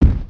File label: Pet Dragon Step Sweetener; creatures pets